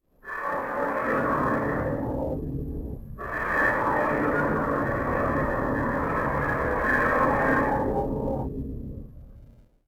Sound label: ambient Voices; XDK4627 BIOS and XDK Launcher and Dashboard(for Retail); ogxbox